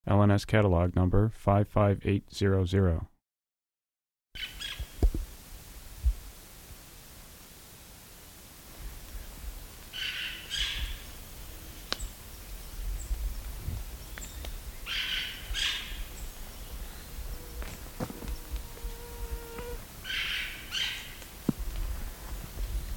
hispaniolan parakeet

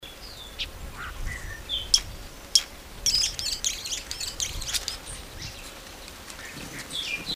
tumbes hummingbird

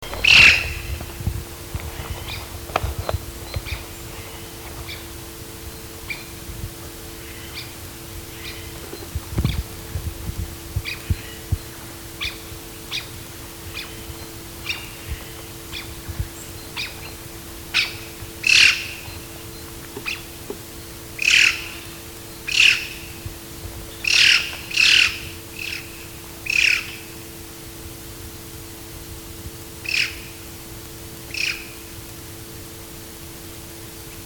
black throated magpie jay

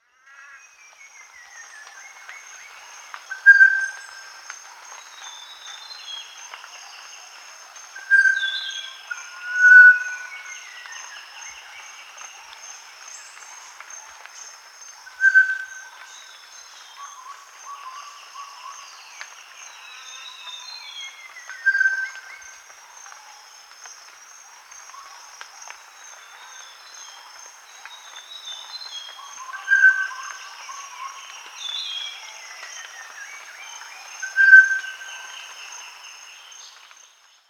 black magpie

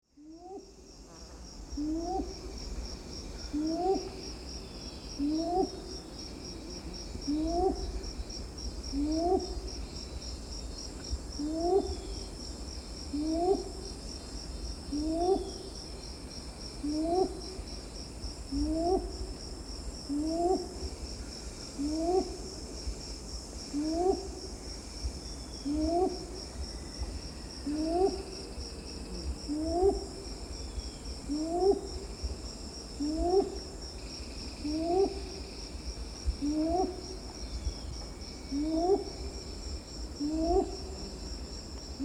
red billed ground cuckoo

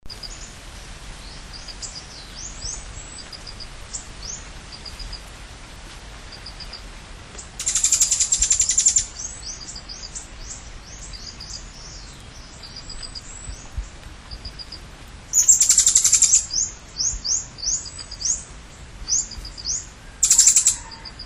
camiguin hanging parrot

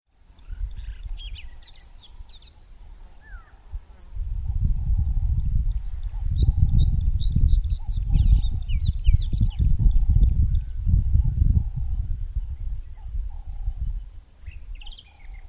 sabota lark

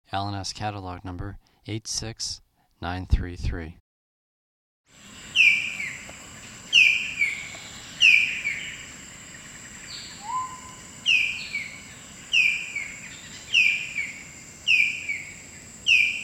blue breasted kingfisher

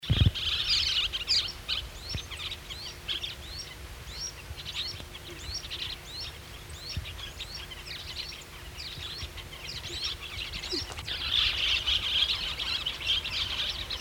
andean parakeet

